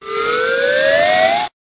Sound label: slide whistle up with ratchet